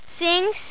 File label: sings